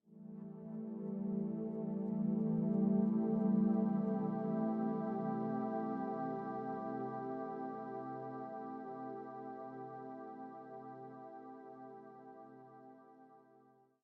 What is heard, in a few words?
Soft